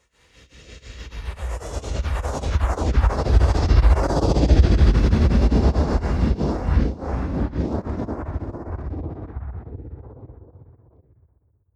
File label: whoosh flutter